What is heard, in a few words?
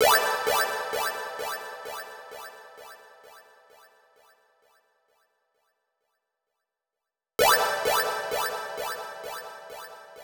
verb arp build, 130BPM